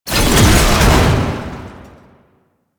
Tank ram ability; Enemies; Abilities; Warframe; Lasrian

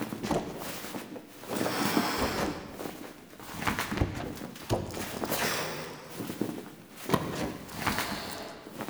Paper Lantern Loop; Objects

